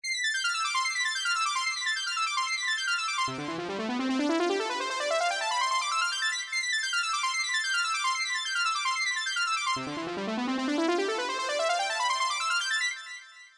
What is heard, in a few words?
synth old70, yamaha sy55